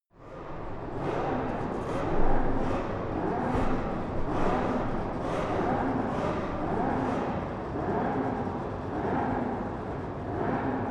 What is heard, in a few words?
racing car f1 multiple rev distant